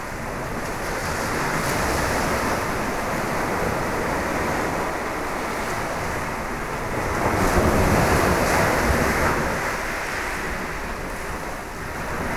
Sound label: gentle low tide